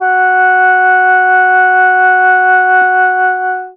sheng f#3; WORLD INSTR 2; WOODWIND AND BRASS

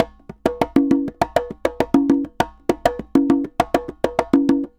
Congas Bomba 100, Drums & Percussion, 100 bpm; Best Service; Festa Latina VOL-1

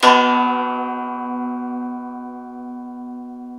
SU GZHENG, Earth Tones

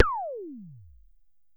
disco, drum; Masterbits - Total Zone

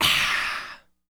PERC, MALE PERC

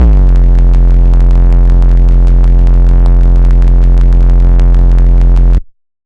F 808 Min 2nd

